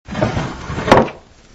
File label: door